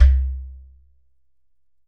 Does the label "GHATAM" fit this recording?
Yes